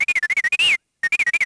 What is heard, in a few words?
vox, droids robots